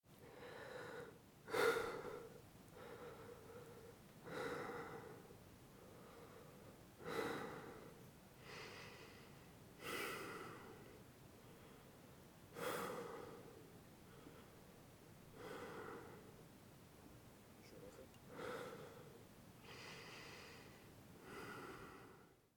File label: breath ok; Escape from Tarkov; scav3